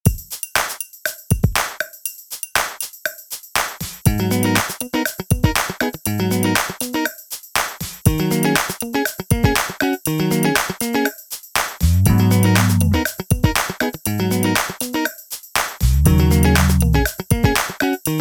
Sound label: drumless